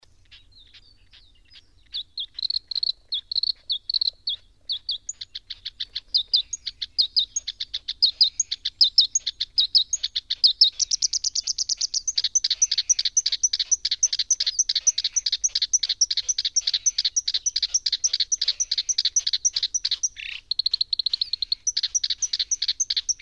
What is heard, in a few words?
koyosikiri, bird